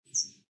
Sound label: natura key del; Spik